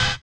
collision; Spectrasonics; Metamorphosis

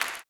CLAP, Blues Soul; Spectrasonics Vocal Planet

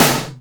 TOM, Drum Dry; East Collexion; Drum 1

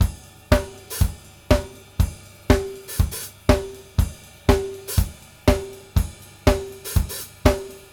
+ CYM, 120; Sampleheads; New York City Drumworks VOL-1

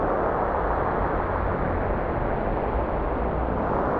sparse soundscape samples; ReLooped

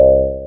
VELO BAS, Bass Analog & Synth, C2; USB Soundscan vol.12; AKAI; VINTAGE

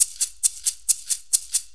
Maraccas, Perc Loops, 4-4 Drum Loops; Drum Tools

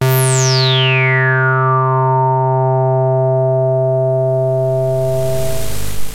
303 FILTER, TWAV BASS; Soundengine - CSR-000 The Art of Transwaves

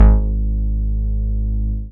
BAS MINI, Mini-Moog

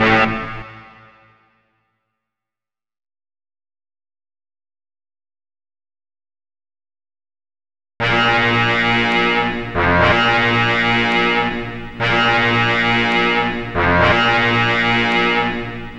Drama Horns; Sonic Foundry (Sony Creative Software); Club Joints and Street Anthems; Ruff Side

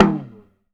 TALK DRUM; AKAI S-Series CD-ROM Sound Library VOL-5

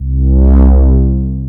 OBERHEIMS

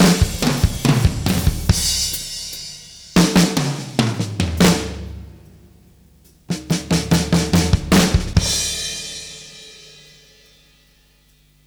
Fills 144; Zero-G; Monster Beats

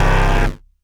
TEKNO, Hyper Dance, FX; Best Service

